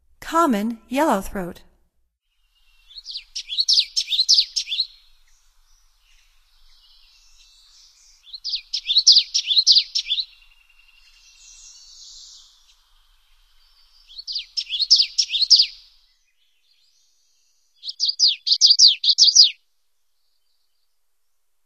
Common Yellowthroat, Songs of Birds of North America; Stokes